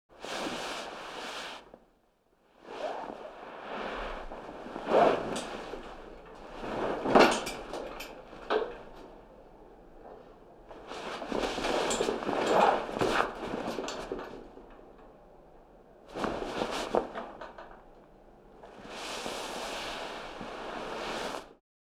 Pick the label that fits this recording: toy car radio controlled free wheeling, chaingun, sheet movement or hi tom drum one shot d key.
sheet movement